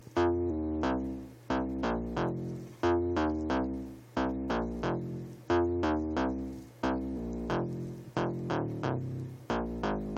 Bass Loop

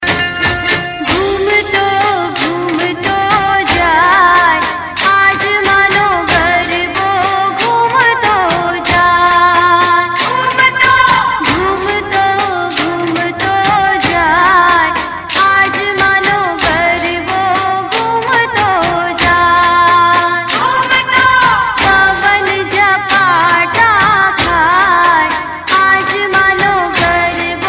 Classic Garba

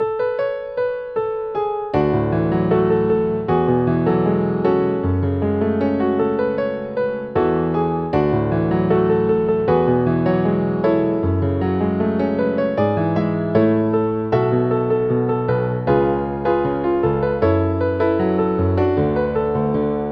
Piano

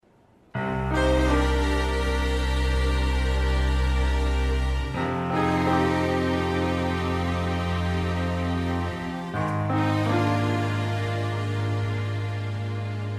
Blues

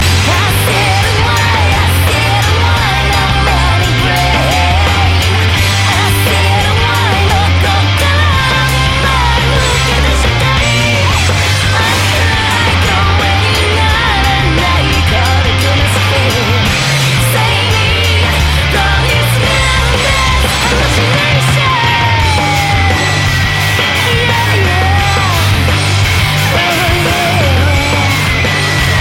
Scream

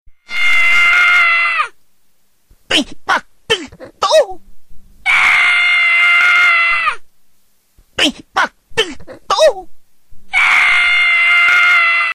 Yell; Scrat

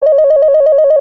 Panasonic Ring

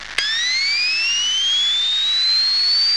Flash Charging

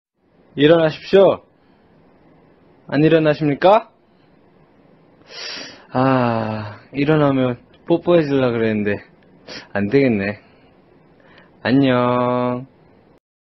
Wakeup Call; Jungkook

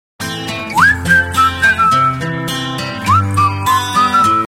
Sms whistle

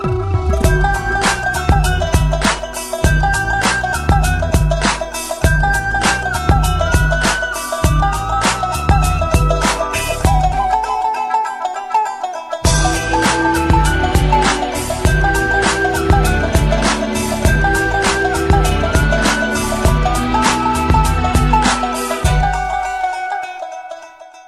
Tumbi

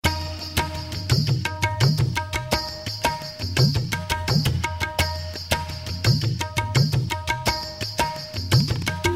Indian Drum Tabla

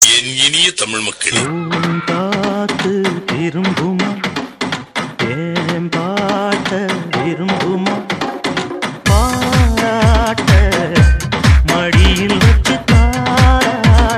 Tamil Remix

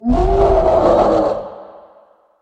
Howl

